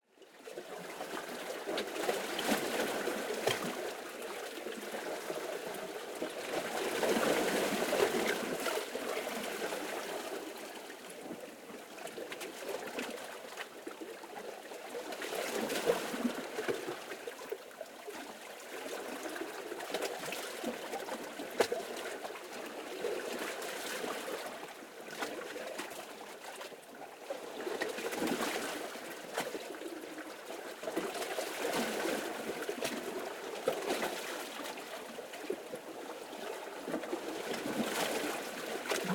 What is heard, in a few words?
Sea Waves Lapping Bettwen Narrow Rocks; PMSFX; Ancient Sea Ruins